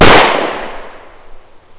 usp unsil, weapons

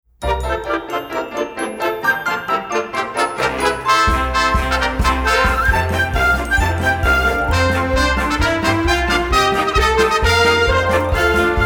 Bow, VOCAL TRACKS; Wizard of Oz

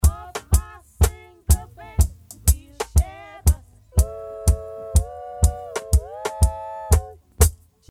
drums, reggae; le reggae

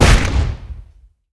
Normal Damage

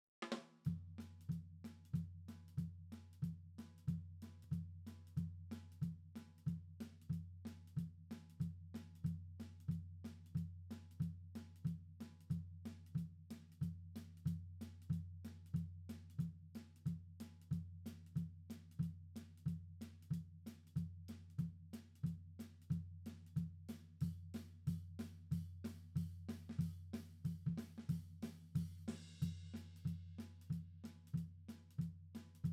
Toms Drums

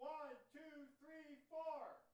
Drums; Visionary One; Honeybrook Album; Visionary 16 Drums Extended

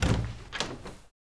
door open; tardis2010; garrysmod

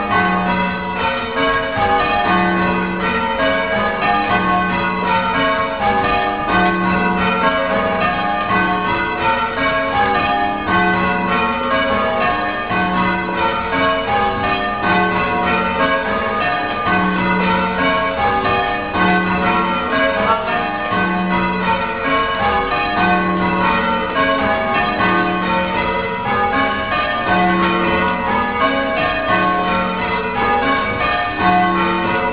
church bells victory peel; VJ Day; burton bradstock

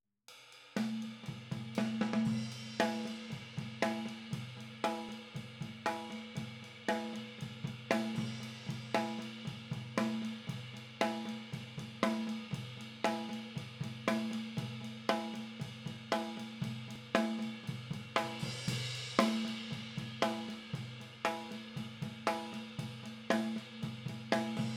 Toms

